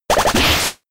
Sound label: Pluck